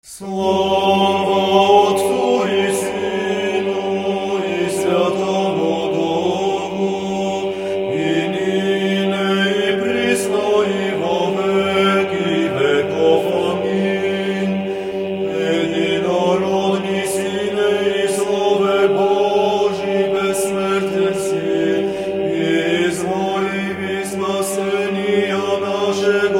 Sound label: Bulgarian Liturgy, Eastern chant, mode 2; Edinorodniy Sine (O Only-Begotten Son); orthodox music